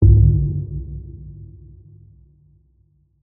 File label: hit thud wood large clunk dark reverb; sound design